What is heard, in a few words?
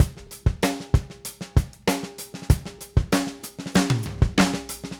Drums Fill; Sonic Foundry (Sony Creative Software); What it Is! 70s Analog Funk